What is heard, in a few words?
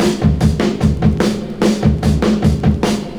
lovin horns, Drum loops, Rolls